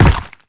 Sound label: step heavy, zombie blind